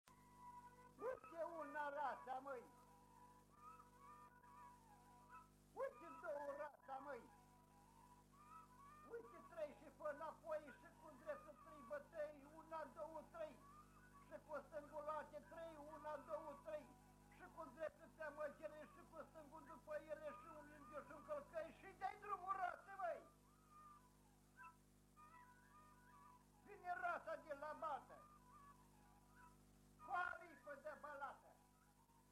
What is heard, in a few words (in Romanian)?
Rata cu strigaturi; jocuri si cantece batranesti; Vaslui